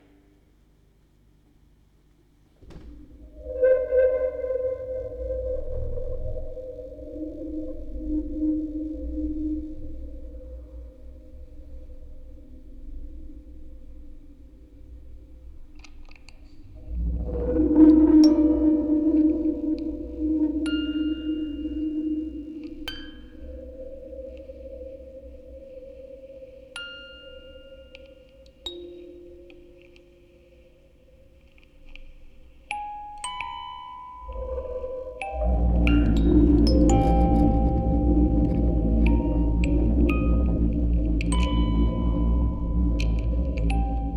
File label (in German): Aufnahme 14 Kanal stereo, Spieluhren-Quartett mit Tonband, Vocoder und Fieldrecording